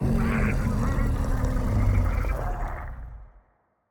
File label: Roar; Xiphactinus